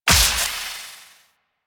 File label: Serpent Ability Tendril Crack; Warframe; Warframes; Alchemist